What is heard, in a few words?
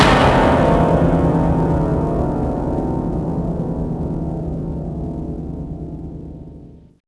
Piano top crash down; Max Payne; manor